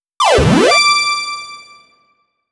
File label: Jingle - Stage Clear; Sphere Knight